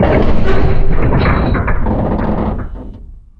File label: bridge up; Mafie 1